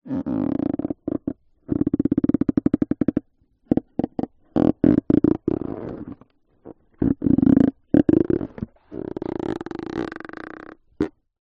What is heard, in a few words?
foley balloon partially inflated handling creaks squeaks